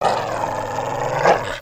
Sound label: Pet Terrier Growl; creatures pets